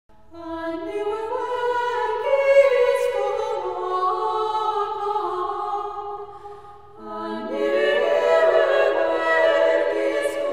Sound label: carol; Alleluia, A Nëwe Work